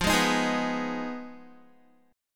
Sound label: F Minor 7th Flat 5th, Guitar; Fm7b5; strummed; 13,11,13,13,11,x; 4E,4A,5D,5G,5C,6F